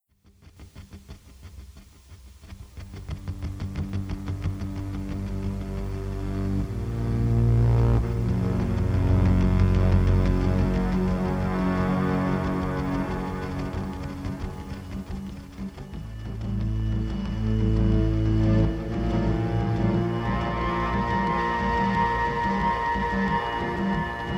mood; inFamous; PS3

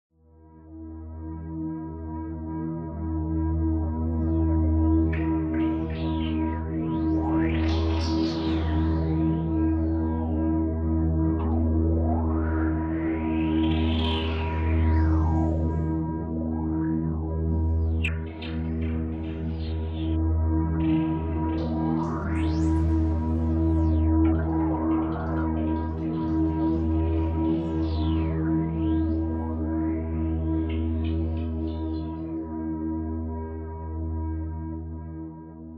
bridge suspense mood, music; inFamous; PS3

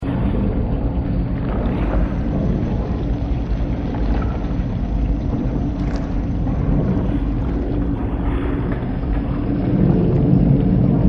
ambience; reaper boss

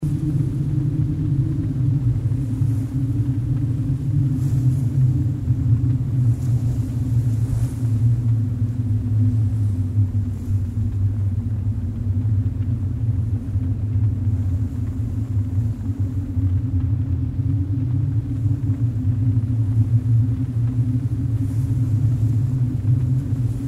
ground zero

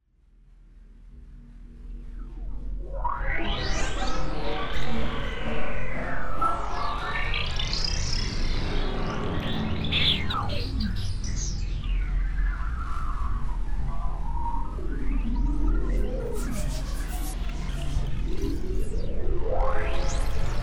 bridge suspense mood, music; inFamous; PS3

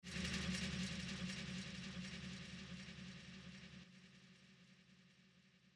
warren suspense mood, music; inFamous; PS3

